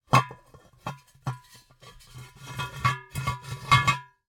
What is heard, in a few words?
Cookie Jar, ceramic, glazed, irregular shape, hollow base, hollow top, rough, resonant, tonal, jostle; strong; Eiravaein Works; Vaeyan IV